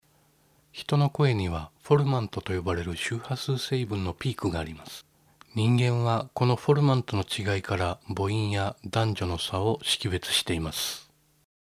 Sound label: formant test